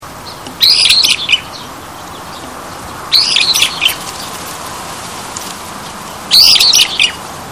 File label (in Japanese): hiyodori